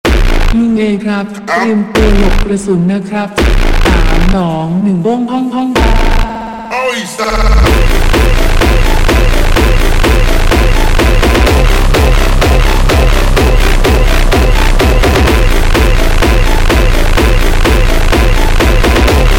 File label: slowed Reverb